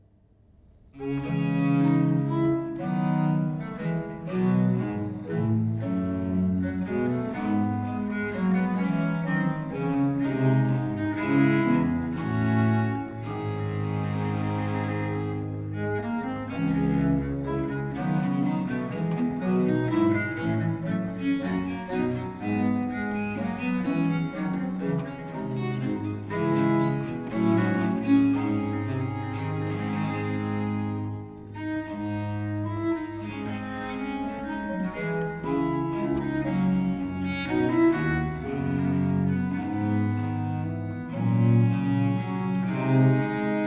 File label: duo for two bass viols, in d major minor